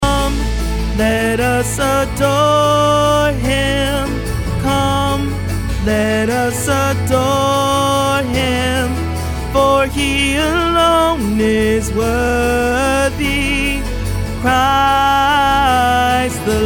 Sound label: Tenor; Christmas 2025; Tenor Parts